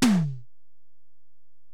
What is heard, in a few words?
Tom, Alesis DM-PRO